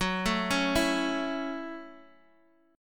F sharp Minor 7th F sharp, Guitar Open D

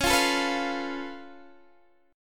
Db Augmented 7th Db down Guitar DADGAD

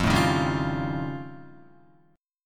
F Augmented 9th F Guitar, chord; F+9 strummed; 0,0,3,0,1,1; JGuitar

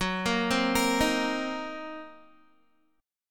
Gb 6th Flat 5th Gb down Guitar; Listen to Gb6b5 arpeggiated

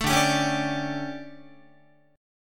D Major 7th Suspended 4th, Guitar; DM7sus4 strummed

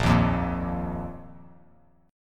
Bb Suspended 4th, Bb, down; Bbsus4 strummed; JGuitar

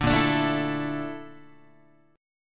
A sharp Major Sharp 11th, Guitar; A#M#11; strummed; JGuitar

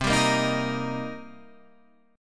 Eb Major C; strummed; Eb/C

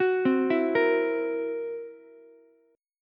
C 7th Flat 5th C down Ukulele; C7b5 arpeggiated; JGuitar

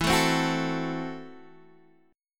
Gb Minor 7th Flat 5th, Guitar

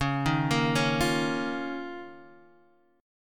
D sharp 7th Suspended 2nd C sharp, Guitar

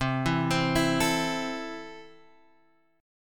C 6th C down, chord; C6 arpeggiated

